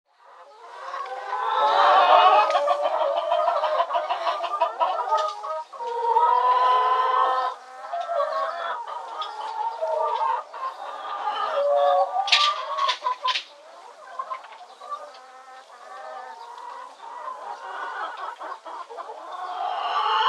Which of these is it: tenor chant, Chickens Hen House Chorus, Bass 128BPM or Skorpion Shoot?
Chickens Hen House Chorus